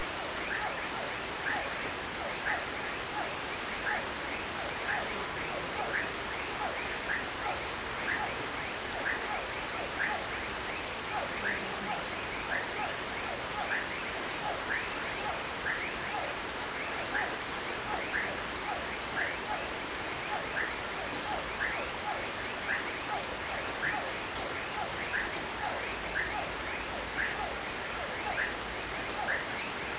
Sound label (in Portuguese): Chuva em chacara a noite grilo bem perto, sapos